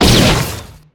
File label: Bot Fire